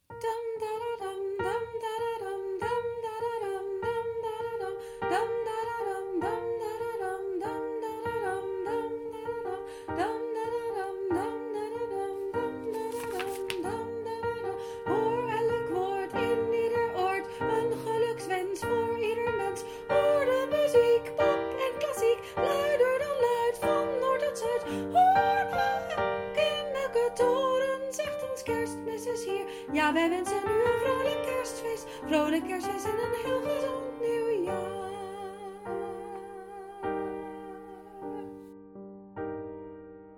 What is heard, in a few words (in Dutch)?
Ukranian bell Sopraan; Setlist Kerst 2021